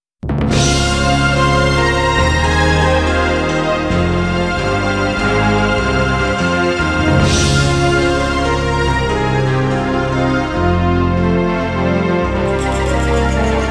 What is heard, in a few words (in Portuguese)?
versao instrumental